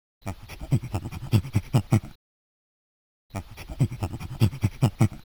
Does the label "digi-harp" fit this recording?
No